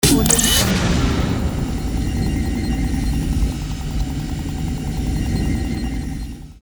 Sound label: racquet launch; sound-ideas